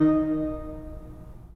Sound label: 3D Pipe Mix Celeste, 3D Organs